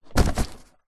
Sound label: foley cardboard box parcel wrapped paper set down thud